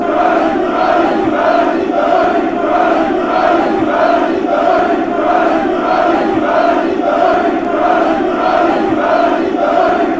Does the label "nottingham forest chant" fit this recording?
Yes